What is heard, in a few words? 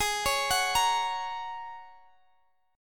Ab 9th Suspended 4th Ab down Guitar Standard; Ab9sus4 arpeggiated; JGuitar